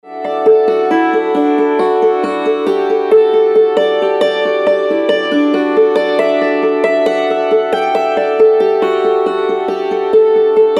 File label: 9th symphony ode to joy